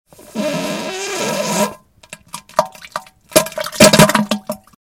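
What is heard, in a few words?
sounds of diarrhea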